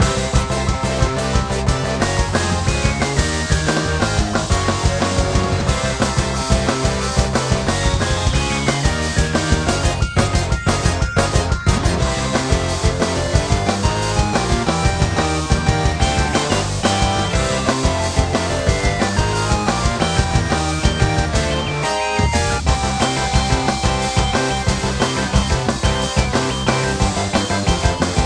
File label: Battle Loop; Tower